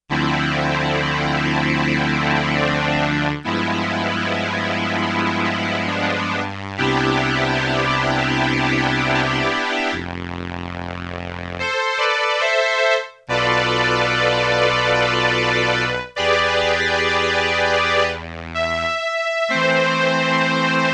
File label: BRASS with Ensemble I, Roland RS-202